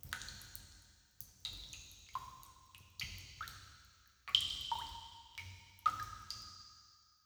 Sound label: waterdrops ambience, undergrounds; soundscapes